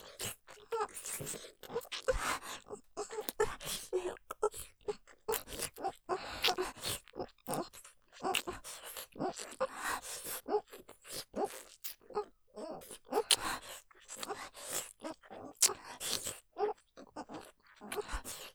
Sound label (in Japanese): フェラ音マシマシ 吐息多めに・さらに激しく・ぬるぐちょフェラ; おかずにスパイス２; 勢いマシマシ